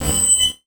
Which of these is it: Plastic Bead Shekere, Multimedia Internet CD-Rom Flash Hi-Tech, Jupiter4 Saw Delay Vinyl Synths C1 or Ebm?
Multimedia Internet CD-Rom Flash Hi-Tech